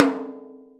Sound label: Concert Tom ff; Digital Sound Factory Virtuoso